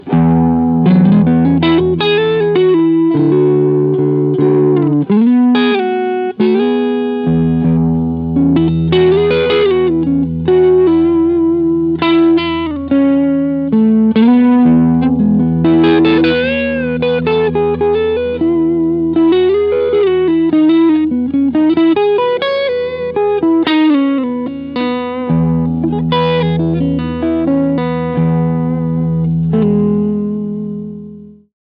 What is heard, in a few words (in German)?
Gain 10 Uhr Bass 15 Uhr Treble 11 Uhr